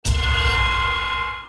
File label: Knight Skill; Gamigo